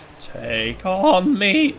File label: manly warcry